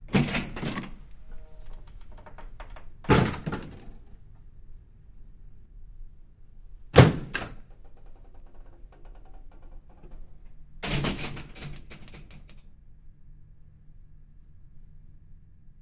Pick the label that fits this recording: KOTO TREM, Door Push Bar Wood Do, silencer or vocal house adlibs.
Door Push Bar Wood Do